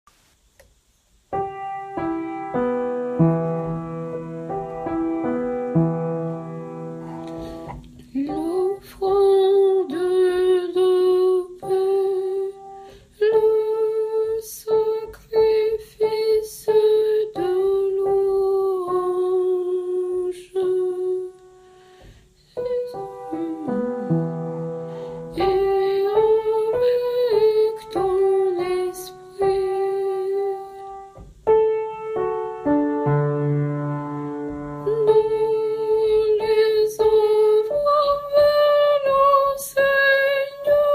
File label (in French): Noel Soprano canon eucharistique; saint Basile